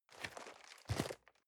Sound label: cardboard box medium turn soft; objekte